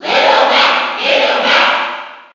Cheer NTSC; Little Mac; SSB4

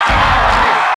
Cheer International; Samus; SSB

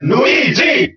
Cheer German; Luigi; SSBB

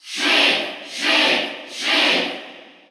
Cheer Russian; Sheik; SSBU

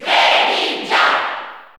Cheer Italian; Greninja; SSBU

